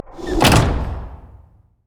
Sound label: door close; LoL; emitters map12